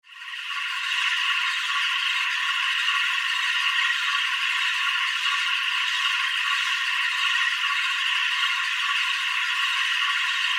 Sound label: Alien, Jungle, Ambience, Night, Insects, Scifi; 5.1